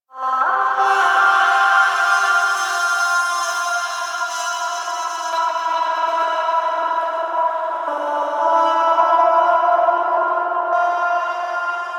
Energy Thread Music; Warframe; Apostasy

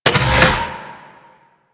Arch Cannon Charge, Weapons; Warframe; Archwing

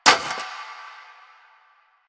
Cafe Chair Short

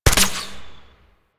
Tranquillizer Rifle Shot; Warframe; Tenno

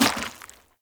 Heavy Grunt Boot Walk Water, footsteps; Characters; Enemy; Sinecure

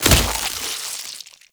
Tree Seed Splat; Ambience; Warframe; Infested Eris

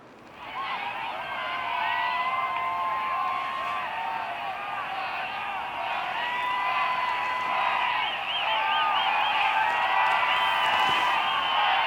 Kiss; Arthur; Warframe; Nineteen Ninety Nine; Quests; Romance NYE

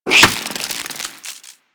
Devour Enemy Bite; Warframe; Mummy

